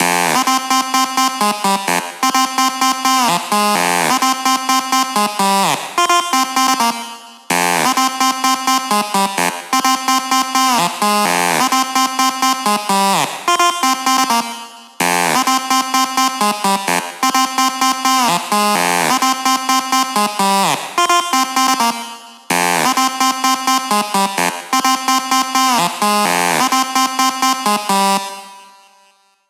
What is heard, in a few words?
128 BPM lead Synth Add Wet noSC, G; Vengeance; Essential Clubsounds Vol.5; Demo Constr Kits; Sidewalk Kit